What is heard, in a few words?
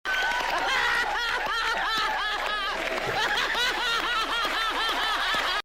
laughing; Salman Khan